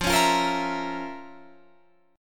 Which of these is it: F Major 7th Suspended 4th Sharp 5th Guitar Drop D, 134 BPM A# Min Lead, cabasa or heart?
F Major 7th Suspended 4th Sharp 5th Guitar Drop D